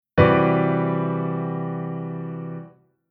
Chord Poly; Jissen

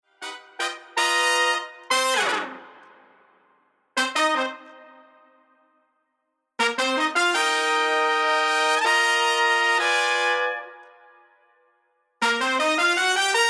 Horn Only

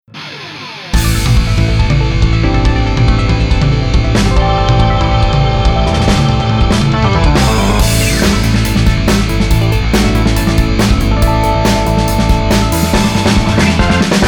Rock; ABILITY

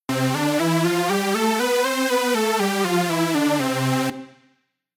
Synth Lead Hard Lead

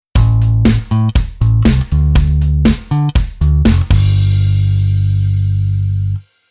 bass

